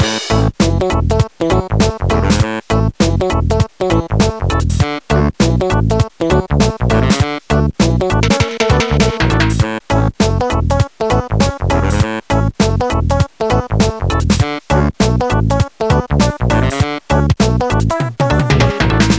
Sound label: Main Menu Music; Crowdy Farm Puzzle